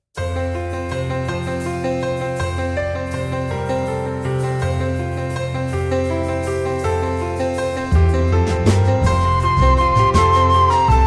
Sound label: Instrumental; Come Now and Praise the Lord